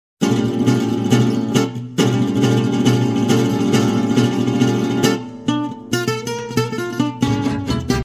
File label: swing intro